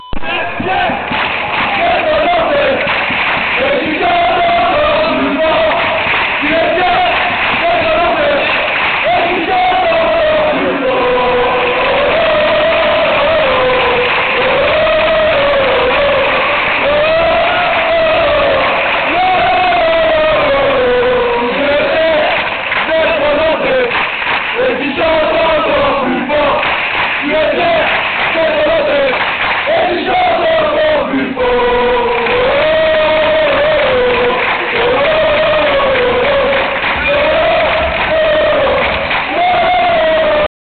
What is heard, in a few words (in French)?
Tu es fier, Chants; Nantes